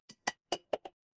glass ceramic

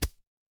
impact punch

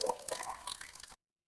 water drip

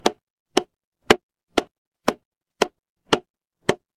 instrument wood block

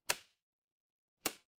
ui toggle

